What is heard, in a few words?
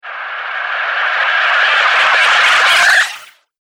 Alien Slurpy Atmospherics; Twistedtracks